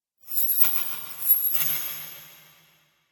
Magic Illusion; Magic Sound Effects

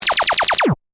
Laser Machine Gun, Fire, Shot, Science, Fiction; Scifi; Blast Wave

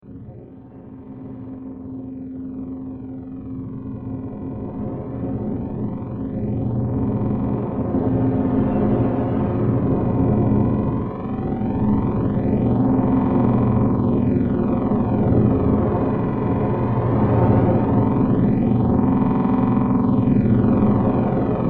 Large Spacecraft, Huge spacecraft transition.; Spacecrafts

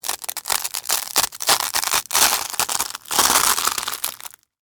Crunch Ramen Noodles; Texture; BlastWave

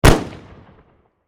Warfare Pistol Glock 23 Single Shot Exterior; Pistols; Guns

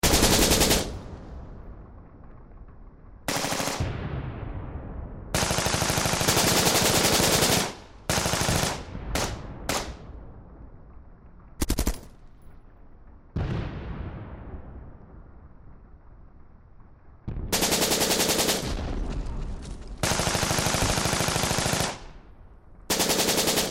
Battle Modern War Loop; Military Battlefield; Warfare; Battlefields (Sound Effects:War); Blastwave

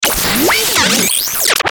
Stinger High Frequency Sweep Static; Production Element Imaging Element Accent Transition

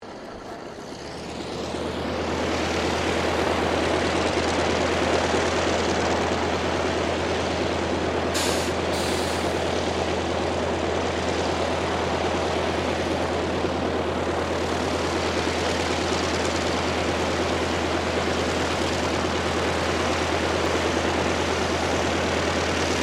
AIRCRAFT CAC WIRRAWAY PREFLIGHT; CA-16 Wirraway; Airplanes; Vehicles

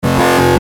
Musical Buzz Descend; Miscellaneous Musical; Blastwave

